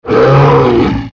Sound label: bat